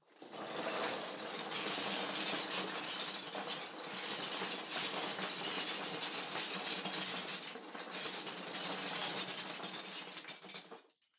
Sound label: Loose Fill Packaging, Far Put In Box; 梱包材